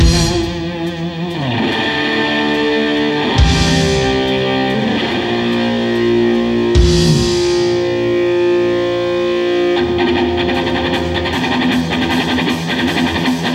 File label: guitar solo live; at the rainbow london november 1974